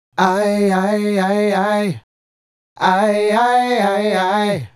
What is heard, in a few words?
Male Group, Hip-Hop and RnB Vocals